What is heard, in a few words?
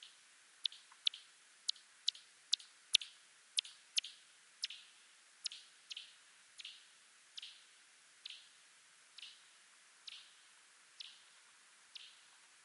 Fringed myotis; Forest Bordeaux